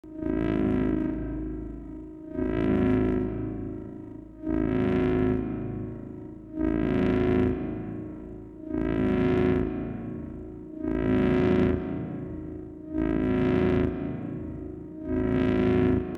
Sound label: Sci-fi forcefield loopable energy pulsing